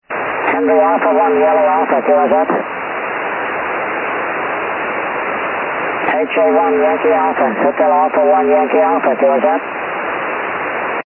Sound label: 70 MHz